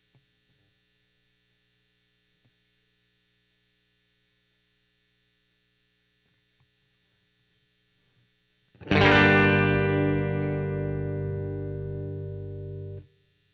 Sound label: GTR OD